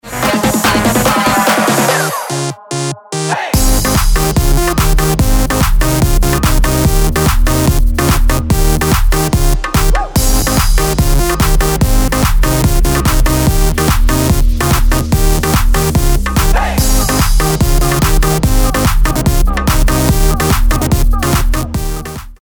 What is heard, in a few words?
BEAT